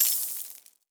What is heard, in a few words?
Ice; ArmyRaid; Interface and Item Sounds; Items & Collectables